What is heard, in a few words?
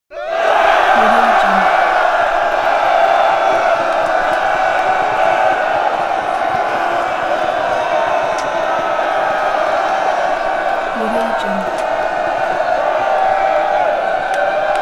1000 male soldiers roar and whoop aggressively